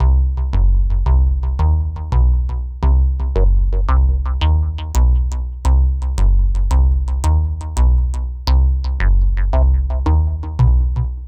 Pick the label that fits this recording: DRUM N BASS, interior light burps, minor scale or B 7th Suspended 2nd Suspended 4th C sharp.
DRUM N BASS